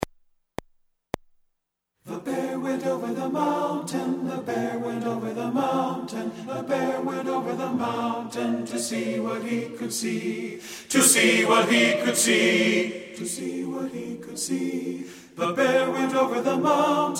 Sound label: TENOR; The Bear Went Over the Mountain